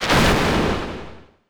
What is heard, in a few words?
giant footstep